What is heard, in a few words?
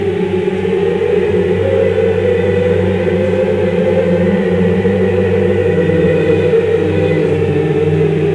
ghost; svencoop